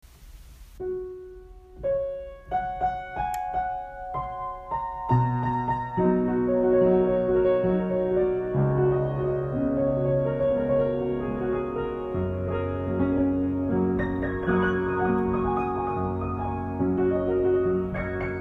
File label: piano